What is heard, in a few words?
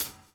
DOWN, DOWN KIT 78